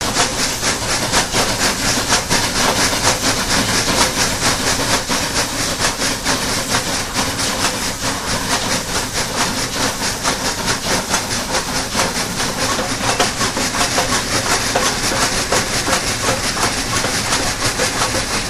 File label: Steam Loco 4 Cylinder, steam train